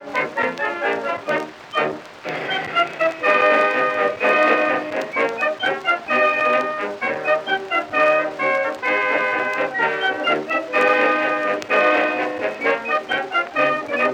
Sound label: The US Field Artillery march; edison